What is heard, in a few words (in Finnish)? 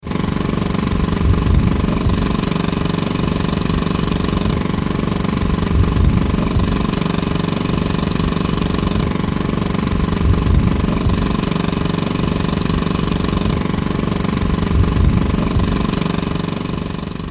Peramoottori